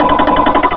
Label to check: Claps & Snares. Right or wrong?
Wrong